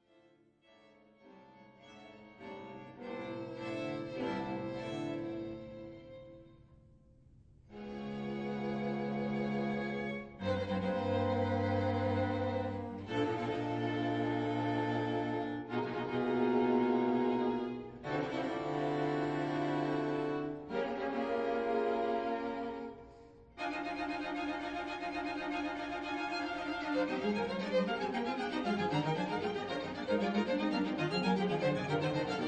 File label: String Quartet